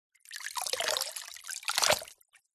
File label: household cleaning cloth wet in and out gently of bucket of water